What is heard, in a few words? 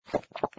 Drink; Yet Another Pixel Dungeon; Android